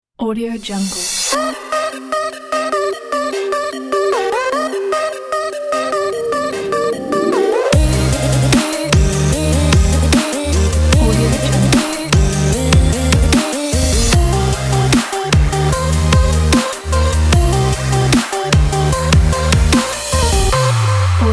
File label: dubstep beat intro logo; toongsturec